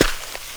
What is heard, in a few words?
SNEAK DIRT, footsteps; AKAI S-Series CD-ROM Sound Library VOL-6